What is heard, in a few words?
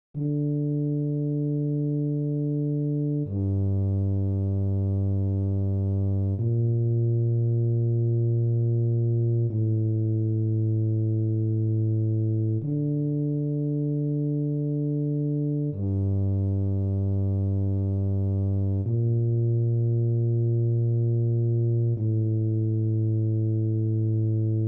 Euphonium